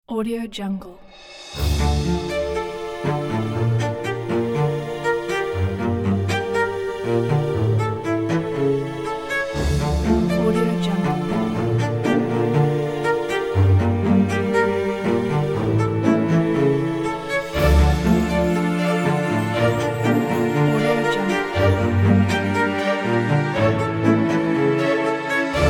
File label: dramatic cinematic